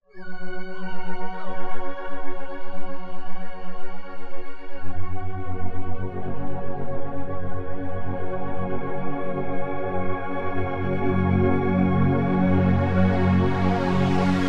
Hybrid Pad, yamaha sy77; sy77 pads

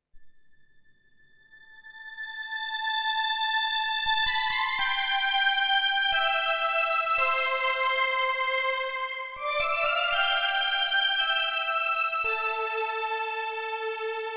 Melody Strings, yamaha sy77; sy77 pads

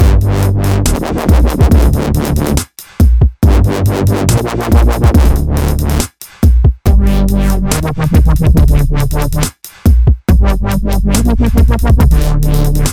DubStep Bass; Tassman4

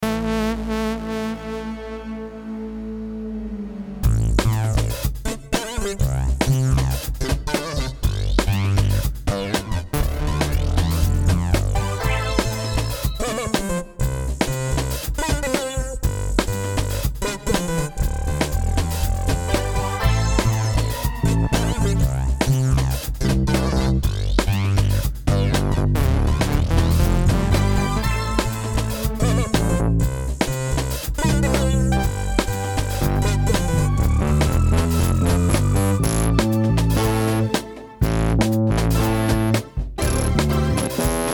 Funky, electro funk

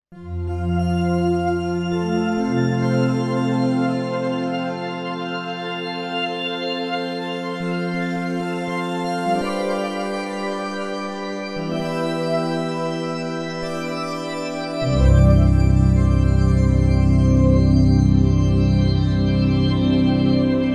Pad, Yamaha SY77; Tomita Pad 2

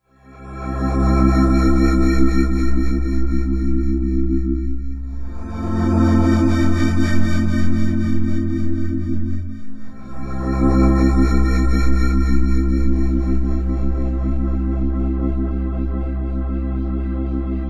PPGland, yamaha sy77 pads

